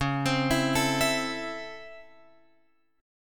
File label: C sharp Minor Major 7th Sharp 5th C sharp, chord